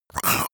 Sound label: monster choking zombie short; koerpergeraeusche; comedy-cartoon; soundpool